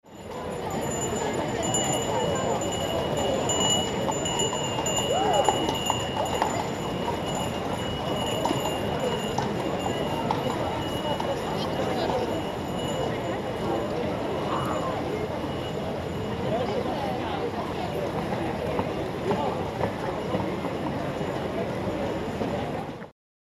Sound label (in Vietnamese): Am thanh On ao quang truong thanh pho; tieng on tu quang truong trung tam thanh pho